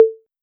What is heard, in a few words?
Conga, Roland TR-808; Roland MV8800; TR-808mk2